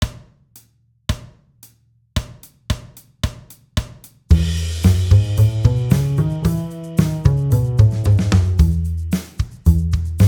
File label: Scales Concert E